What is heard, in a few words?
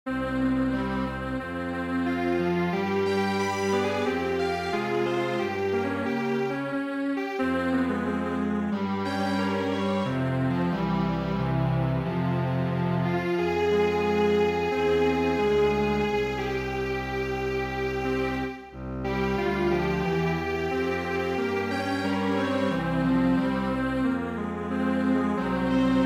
Strings Quartet